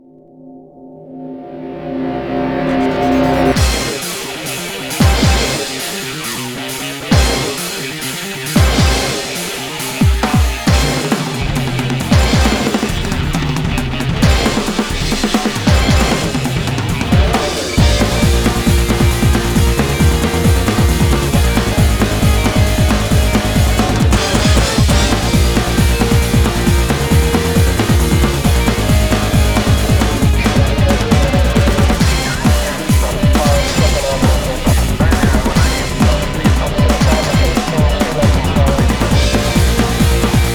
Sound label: bgm boss loop; Shin Megami Tensei Imagine; coc